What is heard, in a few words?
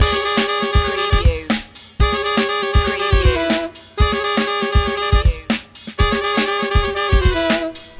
funky feet loop